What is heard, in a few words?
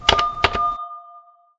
button; SuperTuxKart; introcutscene